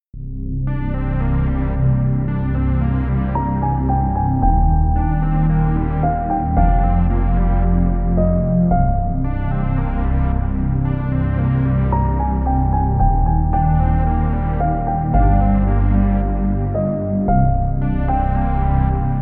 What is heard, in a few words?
Dark Composition; standard pack 2